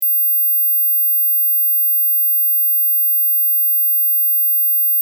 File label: high ring